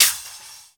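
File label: GATE cymbal; Crypton - DrumBase5000; GATE KITS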